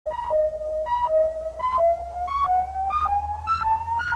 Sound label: color timer